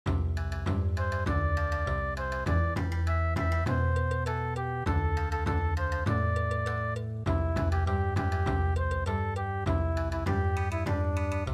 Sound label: Bon Odori